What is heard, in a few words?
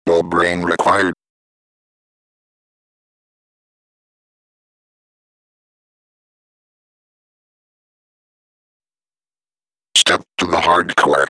vocode